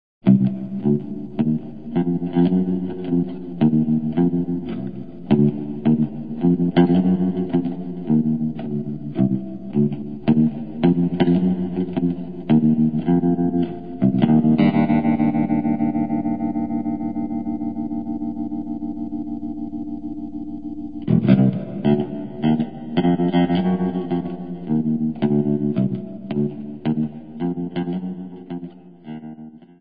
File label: score sample; Voices from the High School